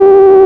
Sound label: ahh, Synths; Notes